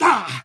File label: male damage; Human